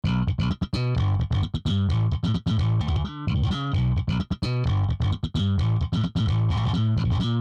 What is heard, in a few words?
Slap